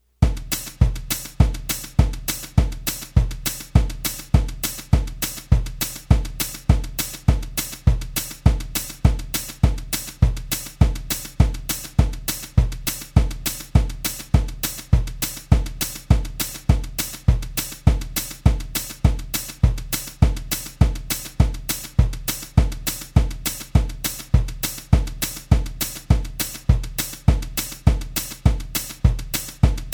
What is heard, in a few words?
Country Boom Chicka Fast